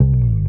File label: Bass Slide, 808s